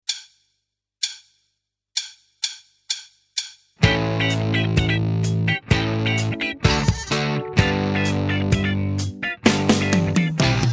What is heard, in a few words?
NO BASS